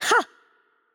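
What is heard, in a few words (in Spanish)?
Attack Light, Spanish; es ES; VO; bloom minigame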